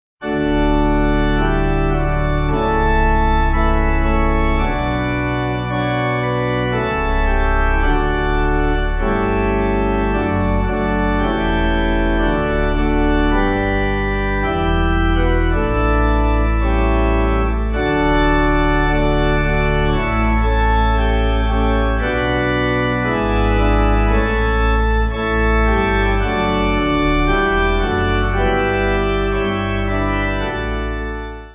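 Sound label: Pipe, Pipe Organ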